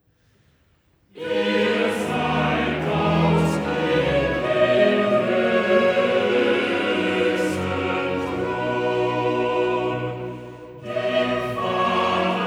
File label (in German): Cantata, Choral; BWV 33 'Allein zu dir, Herr Jesu Christ'; Bach; Frankfurt; 13th Sun After Trinity